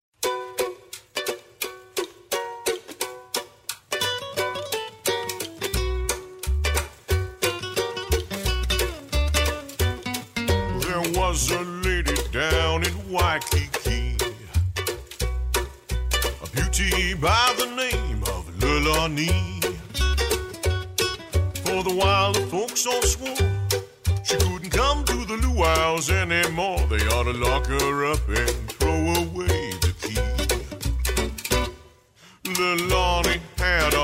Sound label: Ukelele Crime; rossd